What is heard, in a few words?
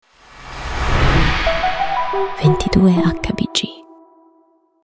SOUND LOGO